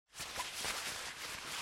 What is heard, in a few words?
foley jacket